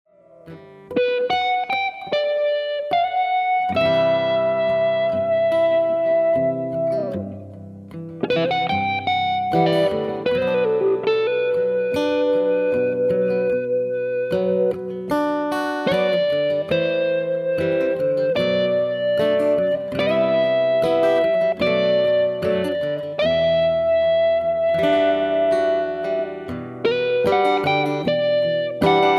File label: coming down electric